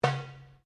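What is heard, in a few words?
Drum Darabuka Slap piano, Egyptian Darabuka Drum; Commanly available Egyptian Darabuka Drum; Drums; Sound Effects:Musical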